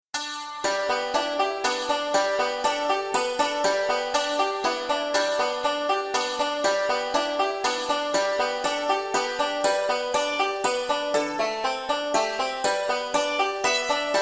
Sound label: waggoners lad, banjo; beginning 5 string